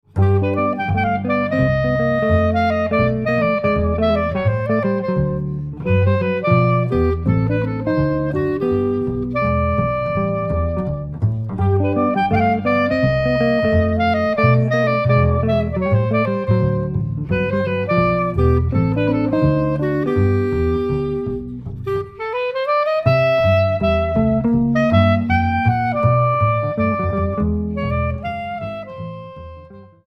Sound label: clarinet